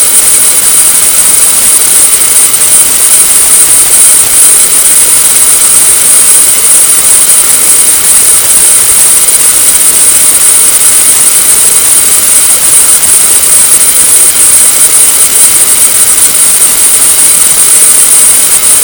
tv static

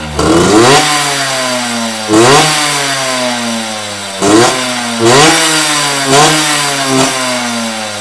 toomey rev, pipe

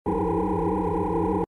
super jump charge

